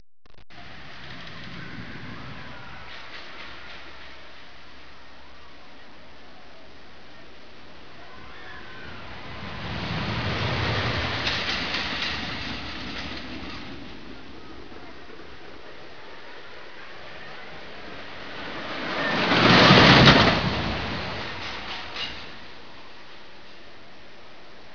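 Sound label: roller coaster; 8bit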